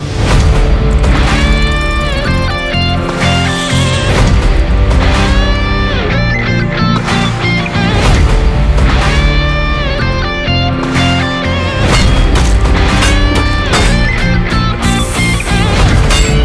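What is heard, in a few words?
MASS background music Ringtone; LEO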